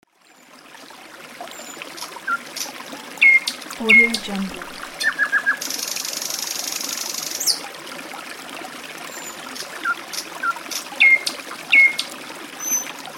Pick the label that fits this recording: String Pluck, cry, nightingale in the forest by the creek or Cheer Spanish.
nightingale in the forest by the creek